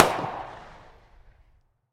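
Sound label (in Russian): глухой выстрел из короткоствольного оружия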